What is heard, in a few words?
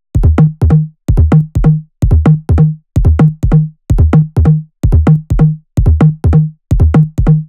Melody Kits 128BPM Root E; Vengeance Samples